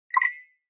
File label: Flash Beep Hollow Tap